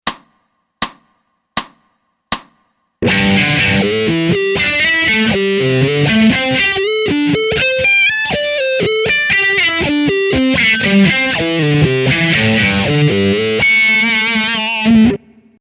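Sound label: A min Pent in 5ths with Slides slow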